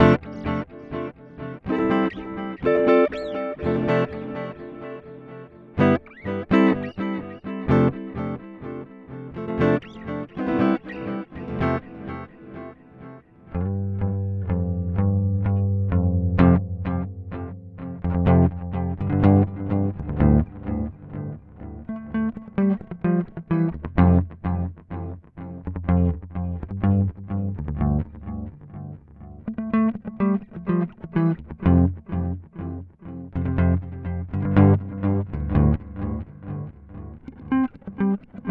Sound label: Amplitube X-Time Digital